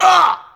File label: Hit; Blind Quest - The Frost Demon